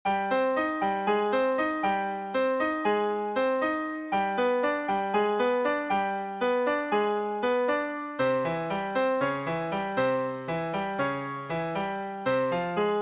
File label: Cm Piano Solo